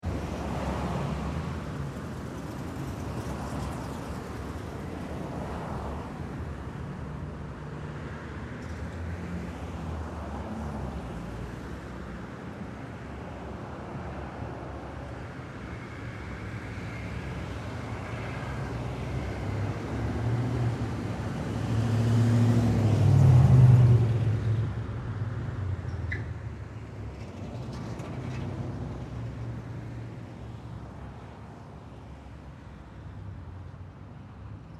Urban Street Traffic Long, Two way moderate traffic; general traffic ambience lax; Two way moderate traffic background